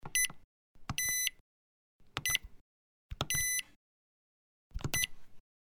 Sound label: consumer electronics switch